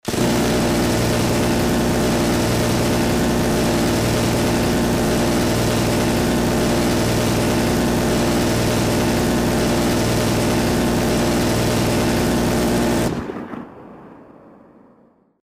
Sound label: Vulcan Minigun